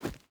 footstep boots forest run; Rust

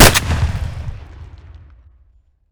mr153 fire close; Escape from Tarkov

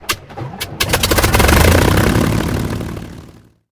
engine start, engines; War Thunder

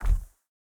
bear footstep wood hard; Rust

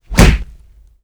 jab hit; Rust

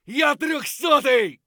hurt light; Escape from Tarkov

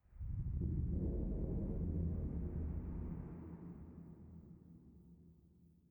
sting metal groan; Rust

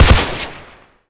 polycraft 3 shot

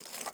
pickup charcol; Rust

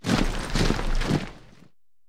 unit move infantry; War Thunder

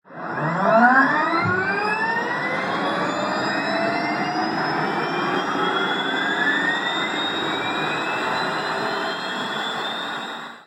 turbine start; War Thunder

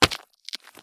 landing gravel; Escape from Tarkov

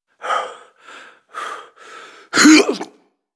chase gunkick; Modern Warfare

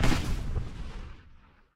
aa fire small, guns; War Thunder

